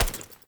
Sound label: Foley Action Body Hit, Default Clothing